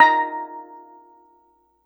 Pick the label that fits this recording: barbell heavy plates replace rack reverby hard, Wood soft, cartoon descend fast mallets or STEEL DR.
STEEL DR